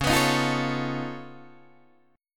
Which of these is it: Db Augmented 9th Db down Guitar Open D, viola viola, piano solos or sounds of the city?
Db Augmented 9th Db down Guitar Open D